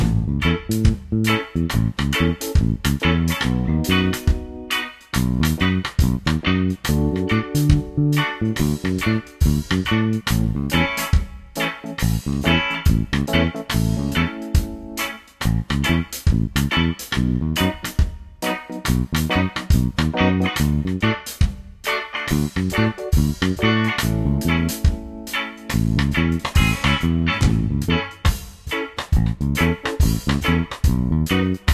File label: reggae